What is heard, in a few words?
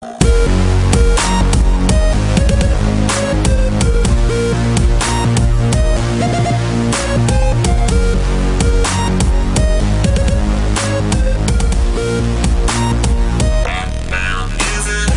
Best Bass